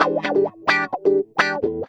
GUITAR LICK; Ueberschall; Jam Box

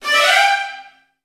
VN FX, Virtuoso Strings

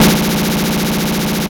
SNR BIG, Snares; AMG; Now CD-ROM (Roland); NOW! Drums

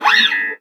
FRET, FINGER MUTE; Xsample VOL-1

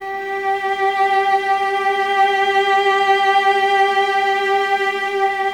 String Ensembles, Violas; G 4; Miroslav Vitous; LINKS ORCH

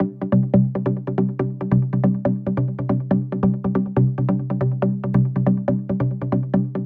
140BPM C; Vengeance - Essential Clubsounds Vol.4

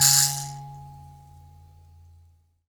PLK Bush Mbira; Roland LCDP11 Africa VOL-1; M'biras

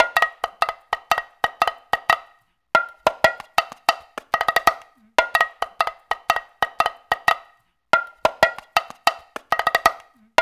AFRO, African Contemporary, AfroTriangle

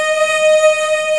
MMM D#4, POP CH

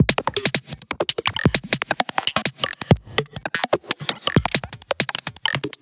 perc random perc kit; Polyend; Recue; percs glitches and layers

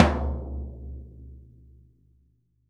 P.F2TOM MF, VELOCITY TOM

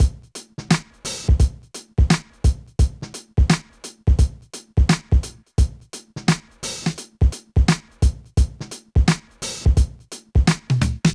Funky Chic Loop, Drum Loops Minimal; Zero-G Smoke

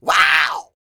YELL, MALE HUMAN; Spectrasonics Vocal Planet

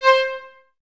VLS C 5, String Ensembles, Violas, VLS Detache; Miroslav Vitous

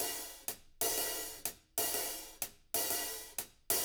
HIHAT LOOPS, Living Drums

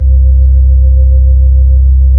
B-3 Chorus

